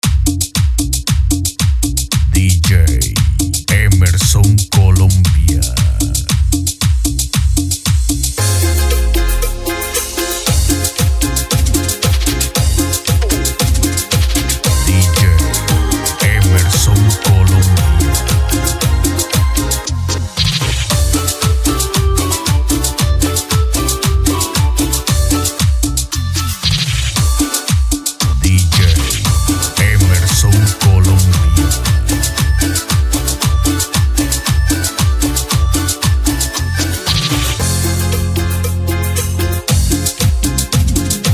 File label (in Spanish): mix cumbia intrumental, cumbia amazonica